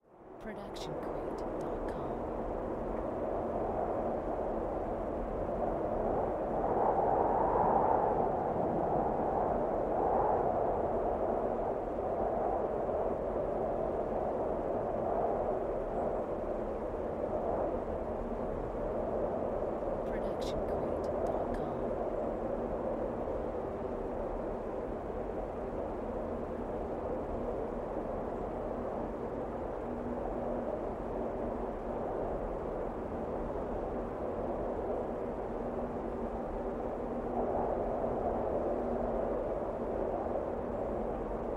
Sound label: wind constant; soundscrate